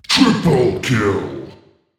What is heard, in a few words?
triplekill